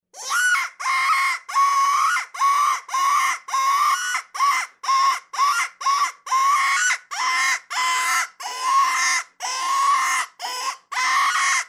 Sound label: Rabbit Distress; Dave Kelso